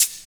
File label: Hhat, HiHat; Equipped Music; Loungin House